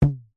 foley rubber band ping; zapsplat

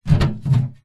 foley metal box large latch movements unlock

